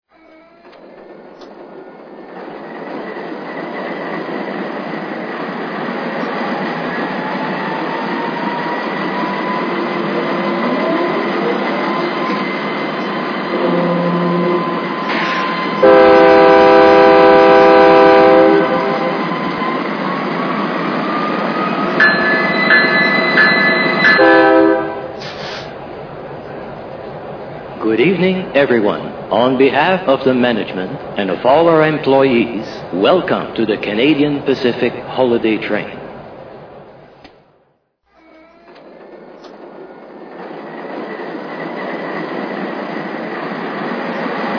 AC4400CW; CP

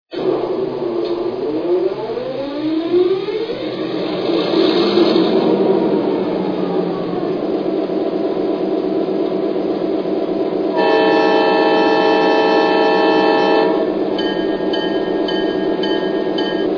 GP38-2

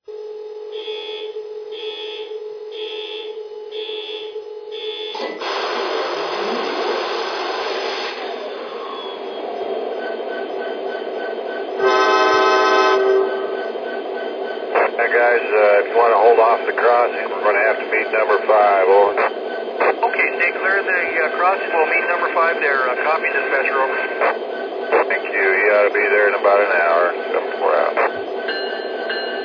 sd70ace

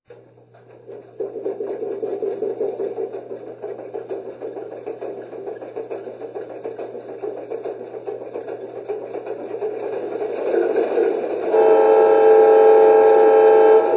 Alco FA2; LI

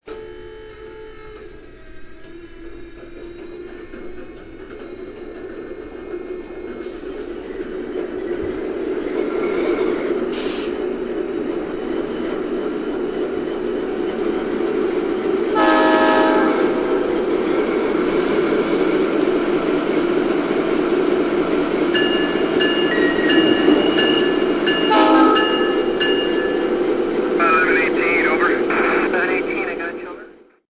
GE Dash9

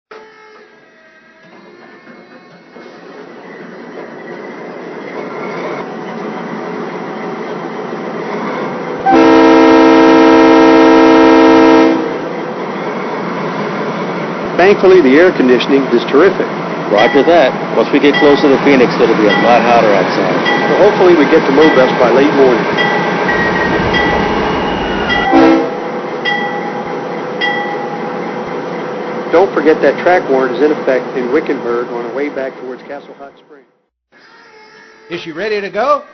GE Dash-8-40BW; SWEB

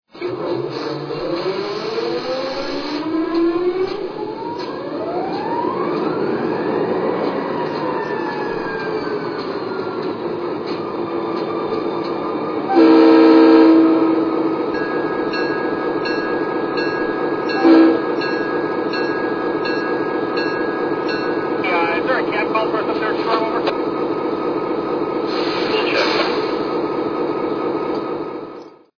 SD45

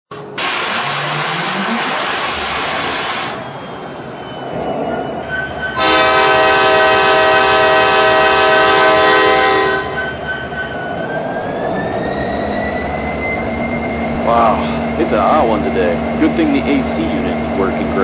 SD70ACe

